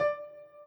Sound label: chord in d